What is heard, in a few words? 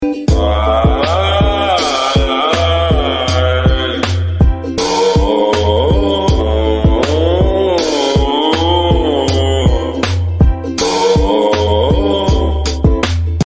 aaaaa aaa